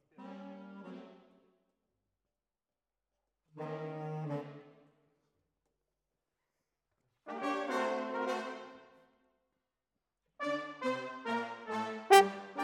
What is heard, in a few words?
Horns